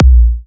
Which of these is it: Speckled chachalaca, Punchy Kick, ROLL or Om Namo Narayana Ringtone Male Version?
Punchy Kick